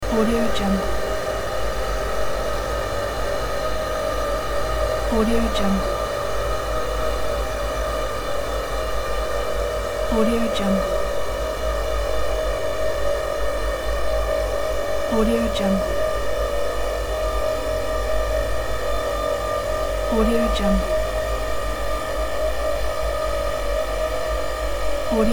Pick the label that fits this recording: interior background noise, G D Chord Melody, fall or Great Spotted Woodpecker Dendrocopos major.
interior background noise